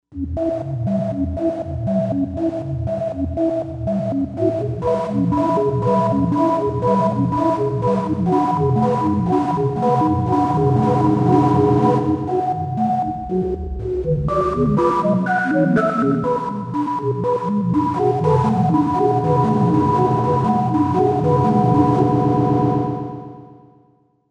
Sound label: Noise Tone; Novation UltraNova; Factory Patches